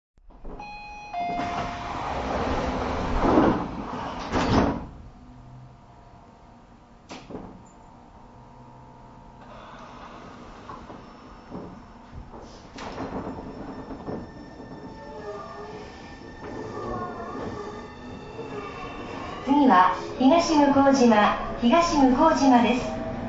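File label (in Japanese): 電車走行音, ＶＶＶＦインバーター：東芝製ＩＧＢＴ; 鐘ヶ淵〜東向島; 東武３００００系; ５００５０系の登場により、半蔵門線直通運用から離脱した編成での収録。この区間での自動放送が新鮮に感じられます。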